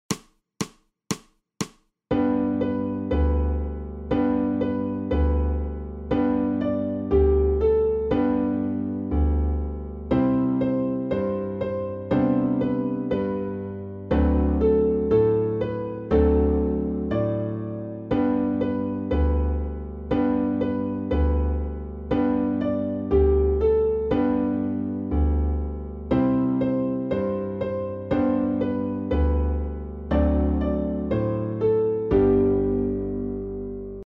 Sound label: SOLO SOPRANO